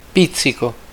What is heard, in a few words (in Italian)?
pizzico